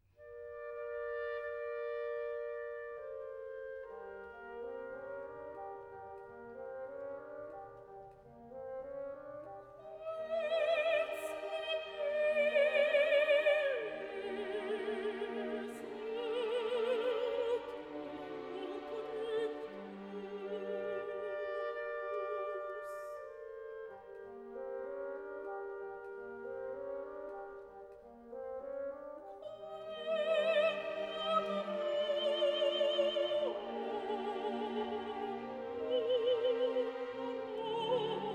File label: Dies irae, Soprano, Mezzo-Soprano, Tenor; Qud sum miser; Giuseppe Verdi; Verdi Requiem